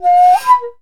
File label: SHAKU FALL; Midjwiz & Shaku